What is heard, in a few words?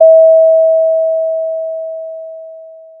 Sound of a doorbell